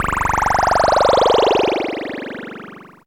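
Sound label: oscillator DECAY, SYNTH FX; AMG - Vince Clarke Lucky Bastard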